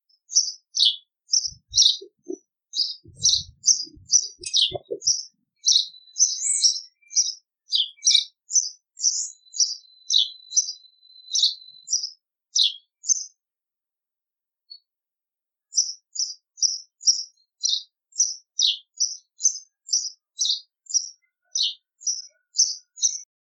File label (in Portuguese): Canto do Pardal